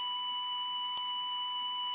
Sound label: EMF Sound; Phasmophobia